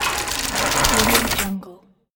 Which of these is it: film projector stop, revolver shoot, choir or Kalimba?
film projector stop